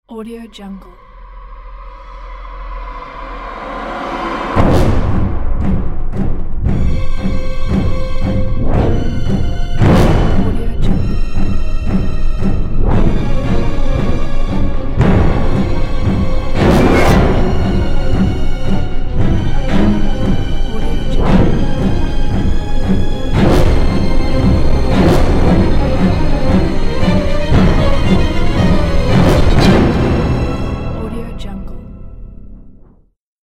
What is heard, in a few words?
horror chase death scene